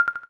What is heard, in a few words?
xylophone